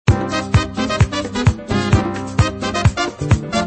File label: MIDI; BQ Russia BQM 1401 Monza